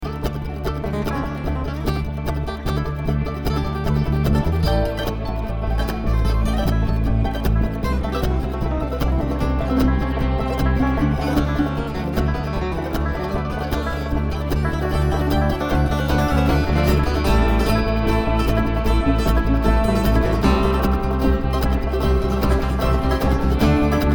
Traffic Jam

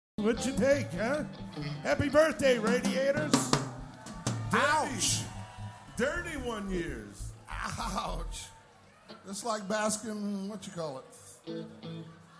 tuning intro

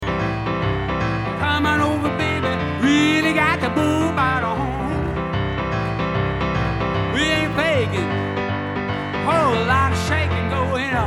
Piano Moment

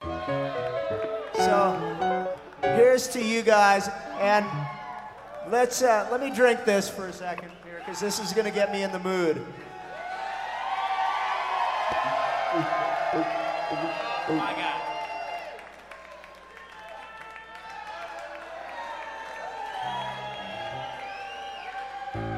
Crowd Chatter

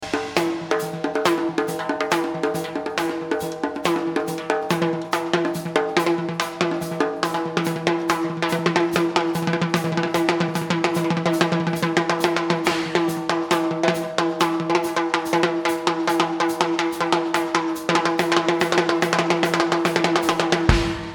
Drums

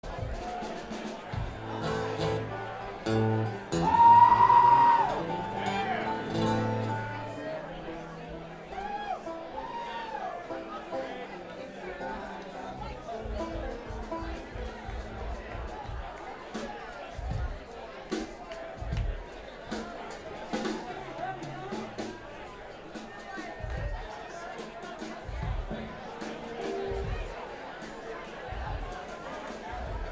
tuning

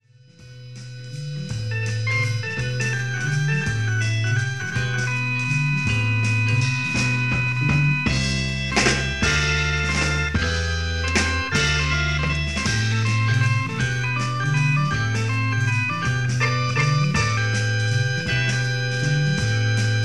Instrumental

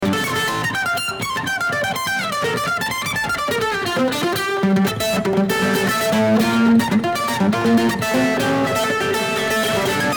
Kentucky Mandolin

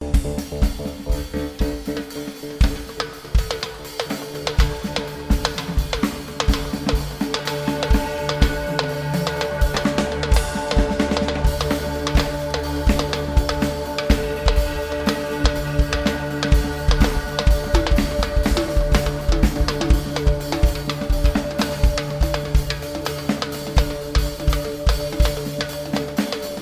Drums

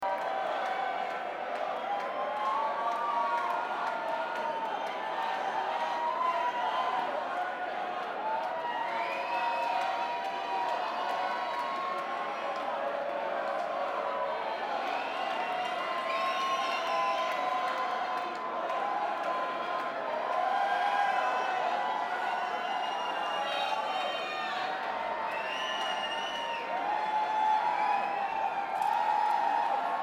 Crowd

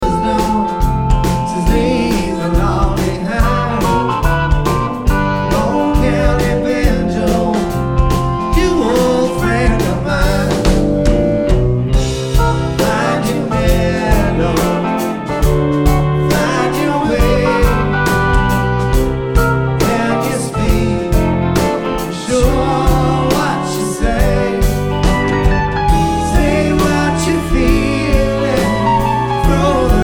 Banjo

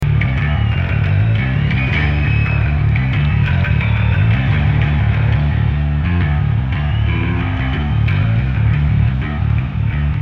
Bass Solo

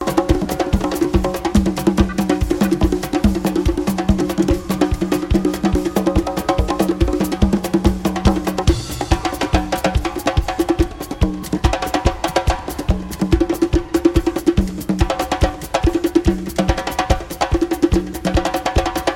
Drums

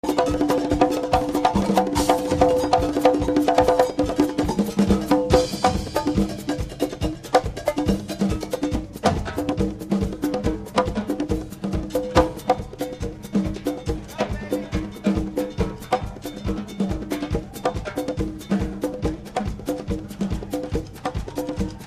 Percussion Jam

